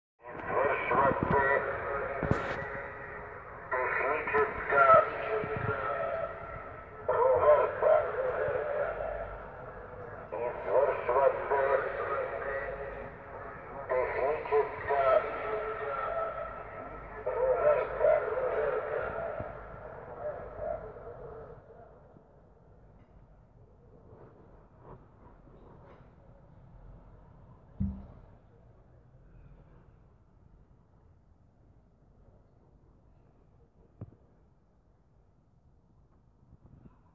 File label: bombtest full